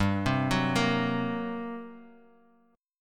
G Minor Major 7th Double Flat 5th, G, Guitar, Open G